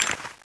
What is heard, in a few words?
skull draw, weapons